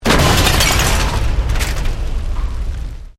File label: Explosion Medium Metal Glass; Created explosion effects, house, building or vehicle effects; Bomb Sound Effects